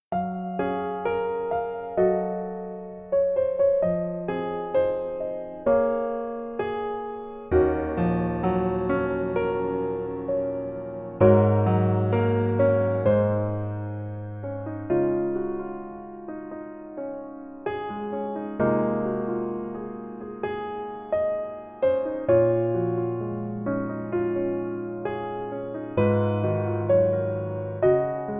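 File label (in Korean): Piano version 멜로디 MR -1키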